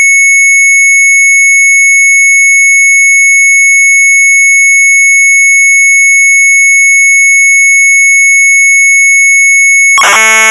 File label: MZ800 Tape; sharp; TIJDBOM; retromelsarchive